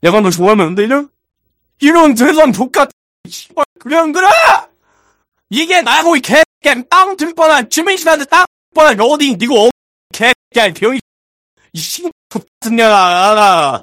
scout laugh short

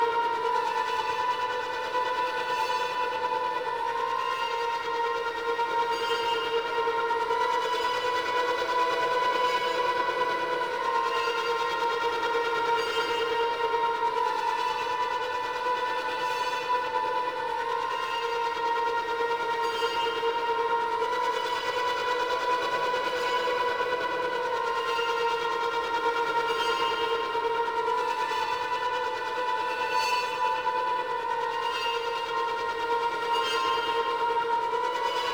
alert theme; chiabihamaru; cv project; invading executioner; zerosiki; slender

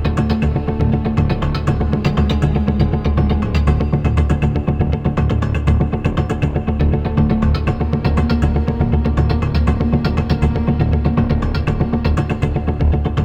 spider chase bgm; granny; zerosiki; slender

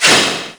slash sound; chiabihamaru; cv project; invading executioner; zerosiki; slender

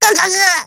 pain severe; voice packs

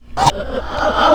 normal idle; floaters; slender

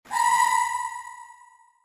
scare; whiteface

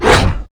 jester attack; lethalcompany; zerosiki; slender

